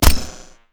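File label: mech crossbow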